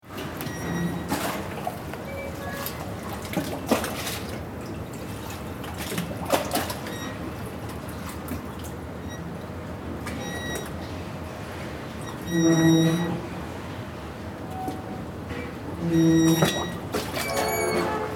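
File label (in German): Hamburg Landungsbrücken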